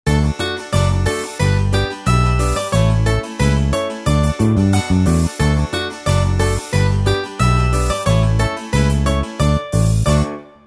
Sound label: Karaoke